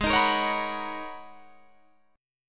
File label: Ab Minor 11th, Ab, Guitar, DADGAD